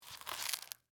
Velcro Close Pouch, Industry, Velcro, Close, Leather, Pouch; Ripping; BlastWave